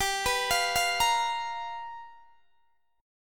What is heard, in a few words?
G 7th Sharp 9th G chord, Guitar DADGAD